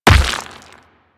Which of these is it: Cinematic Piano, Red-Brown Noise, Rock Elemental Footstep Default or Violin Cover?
Rock Elemental Footstep Default